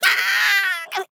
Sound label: Otter death